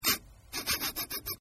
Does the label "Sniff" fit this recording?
Yes